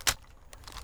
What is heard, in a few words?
slap, ak47